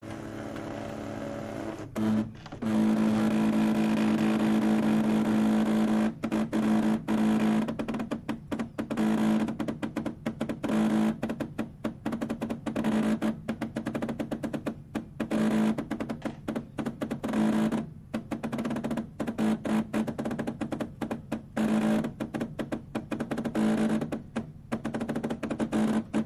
Machine Whirs, Buzzes, And Grinds Rhythmically For A Minute. Staring At About ( 1; 07 ) The Rhythm Changes In Complexity & Pace ( Fax Machine ); Fax Scanner Copier; Office Machine